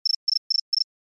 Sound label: Eco Chirp, phonetones; Samsung; GT-S7550 Blue Earth